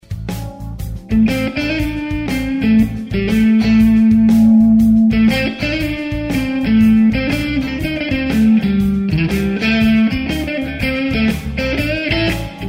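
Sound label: Marshall blues od, guitar pickups; P90; Marshall OPD1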